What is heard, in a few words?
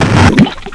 gurp; openffa